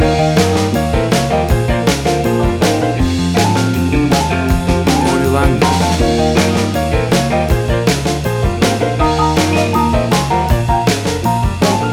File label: 50s Prom Band